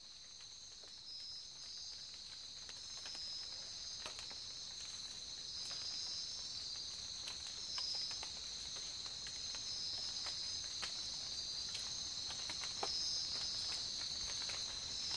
Tropical Rain

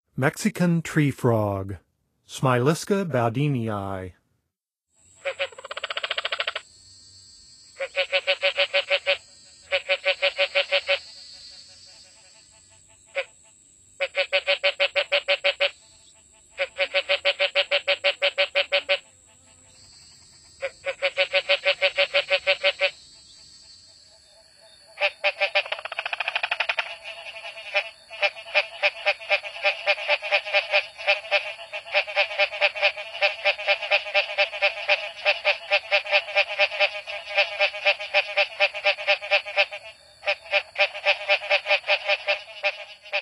Mexican Treefrog; N. America; Songs of Frogs & Toads of North America

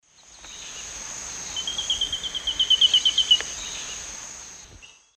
Laterallus exilis, song; S. America; Eastern Lowlands

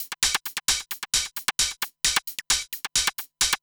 HiHat Perc Loop, Dance Beats

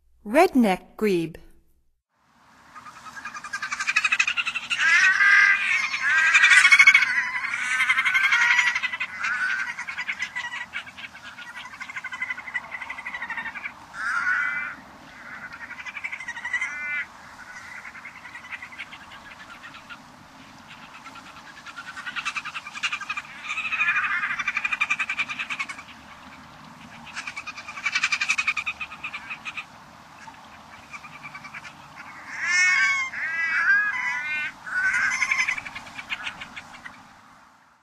Red necked Grebe; North America; Stokes

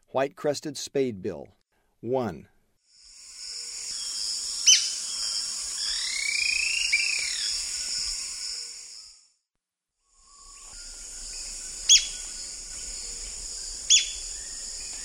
White-crested Spadebill; S. America; Eastern Lowlands

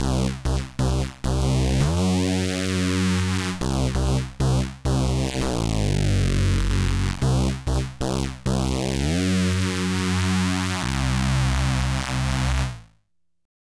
Synth, Breakbeat

